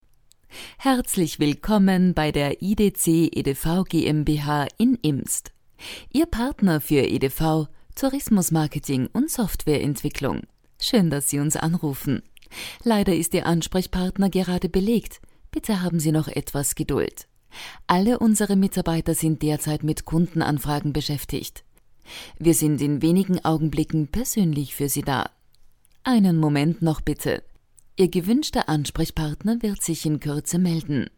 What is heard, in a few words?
audio jingle; idc